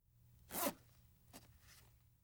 Zipper; Fairlight Sound Lib

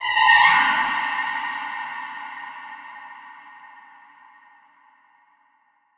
Weird scary

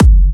Kicks

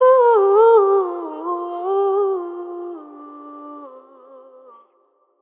Ad Lib Wet C Min, Vocals; Cymatics - Eternity Sample Pack; Ad Libs

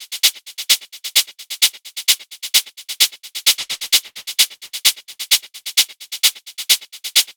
Hat Loop 130, Techno

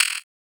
Perc, Psytrance; Hats & Percs

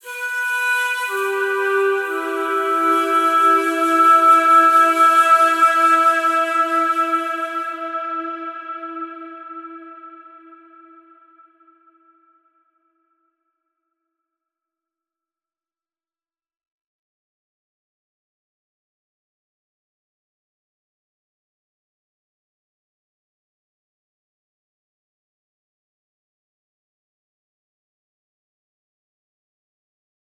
Flute - Am 60Bpm; Construction Kits; Ghosthack; SkyRealm; When The Bell Tolls

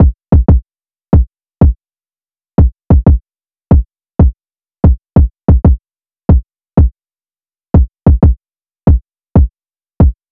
Drum Loop - 93 BPM Kick, RnB; Barely; Cymatics; ANALOG EVOLUTION Beta

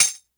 Tambourine Perc; House; Drum One Shots; Percussion

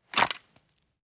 Gun Draw Out Pistol; Fairlight Sound Lib

